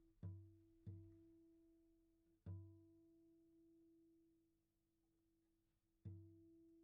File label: Chordz